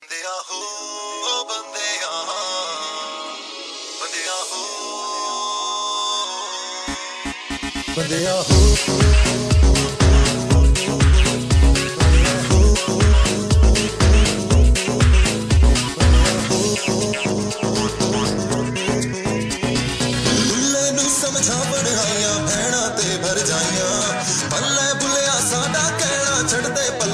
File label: remix ringtone